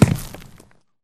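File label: Bullet Light Ballistic Dirt impact; Titanfall 2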